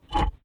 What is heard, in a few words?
push boulder